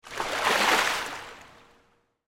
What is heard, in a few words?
Splash Indoor, Pool; Water; Pools; Sound Effects:Backgrounds; BlastWave